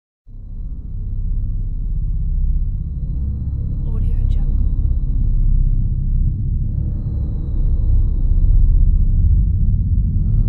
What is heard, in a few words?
dark horror ambiance